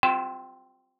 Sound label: Tech, Weird Side Samples